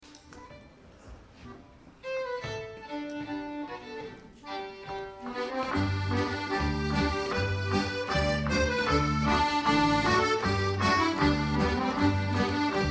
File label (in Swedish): Schottis